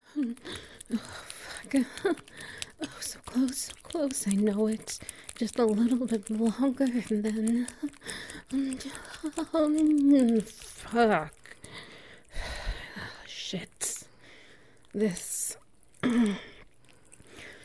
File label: Wet Noises